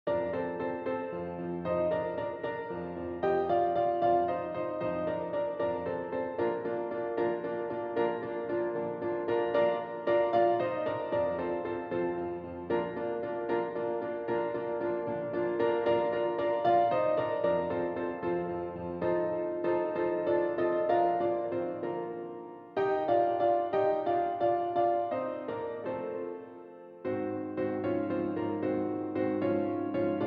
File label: Drinking Song TTBB - Accompaniment